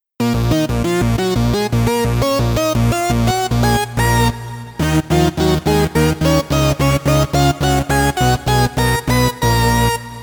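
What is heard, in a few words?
lead 3 waves; the transmitter soundset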